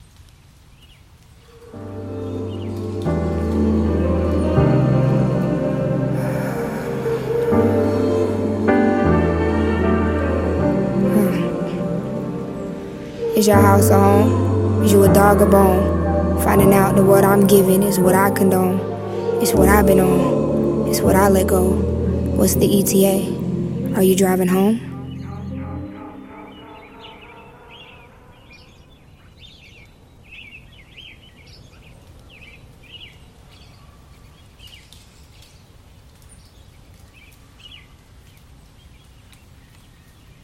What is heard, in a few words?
Piano